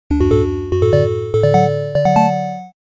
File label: Level complete; super ultra star shooter